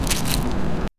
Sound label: picking strawberry; plant movement